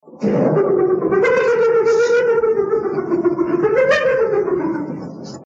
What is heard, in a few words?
Hyena Laughing